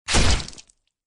Sfx Hero Dead

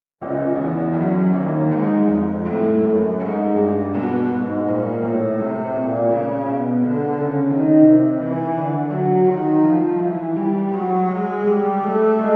cello; darkness within 2 the dark lineage

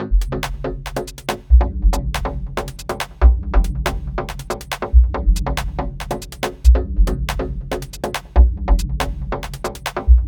Boss Phase2

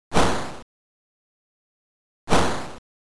Jump Sound; Oretachi Game Center Zoku: Yie Ar Kung-Fu

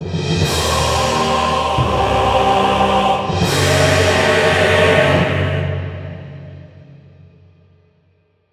GAME FAILURE

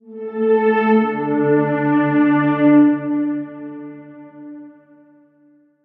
health low; Armello The Bandit Clan

